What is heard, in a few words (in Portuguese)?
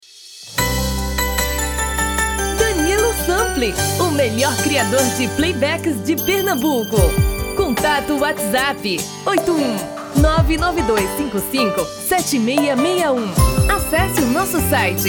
Vers Brega